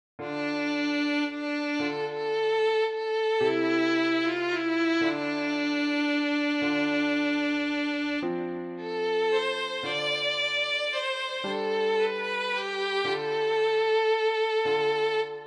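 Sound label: violin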